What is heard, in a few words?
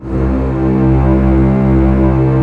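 strings C1 FF L; Sound On Sound - Peter Siedleczek's Orchestra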